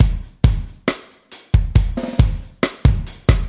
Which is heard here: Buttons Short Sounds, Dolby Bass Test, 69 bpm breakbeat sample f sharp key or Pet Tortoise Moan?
69 bpm breakbeat sample f sharp key